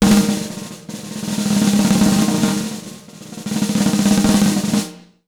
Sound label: 100 B BEAT, Jazz Latin Drumloops